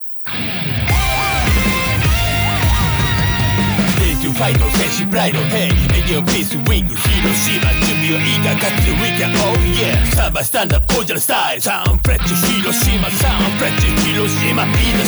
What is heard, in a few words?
bgm only